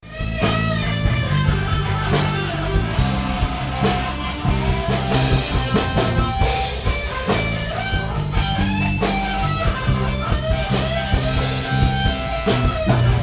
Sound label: Dropouts Shred End, Gretsch